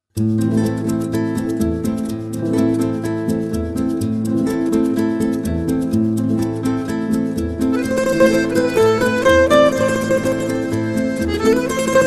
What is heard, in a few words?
Harmonica Guitar